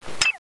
claymore trigger off

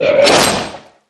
zombie normal male attack; player

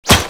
crossbow draw, weapons; crossbowex

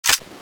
clipout; skull3; weapons

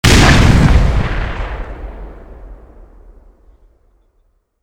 rocket explode

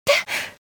human female surprise; cso

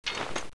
claymore draw

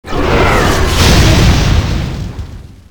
boss dash; cso

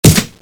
coilgun

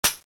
oicw foley

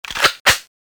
clip in, weapons; thanatos3

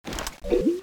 draw; bloodhunter; weapons

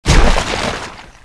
zombi trapped; cso

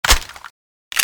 vulcanus reload; weapons

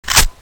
psg1 clip in

